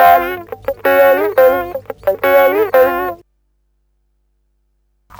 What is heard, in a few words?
gtr, guitar